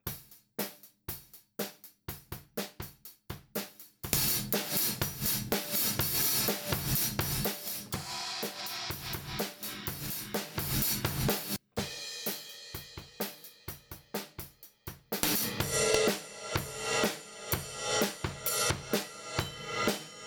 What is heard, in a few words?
AKG C60 Drums